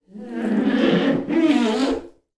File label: household bath wet hands rub bath edge squeak